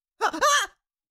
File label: Cartoon little monster voice sigh surprise